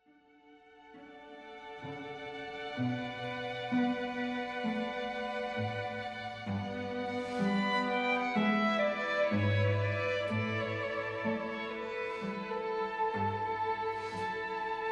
Processional, Strings

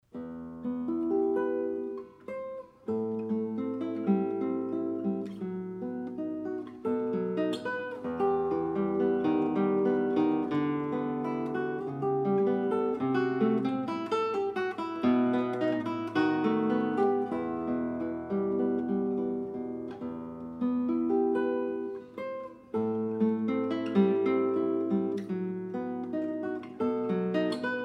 Evocacion South American Piece, Guitar; Jose Merlin

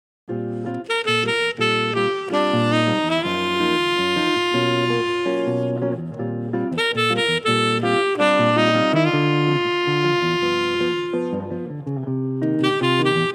Bossa Sax Guitar Duo; jazz band

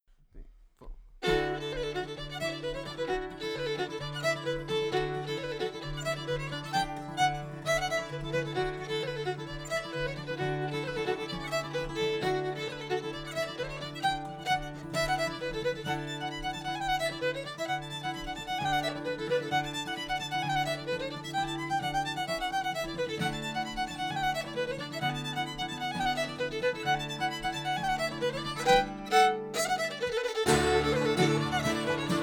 Irish tune, Bluegrass Band; Silver Spear

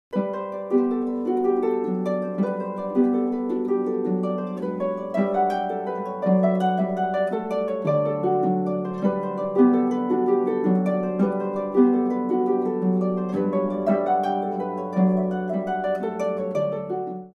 Air with Variations Handel, harp

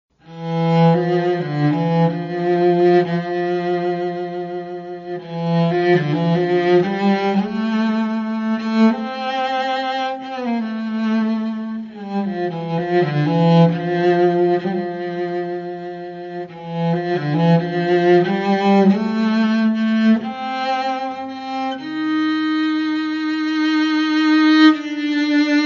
Cello

